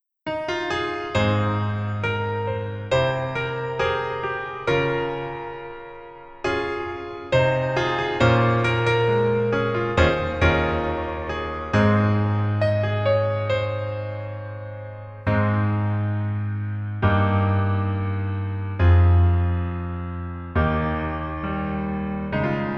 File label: Piano ver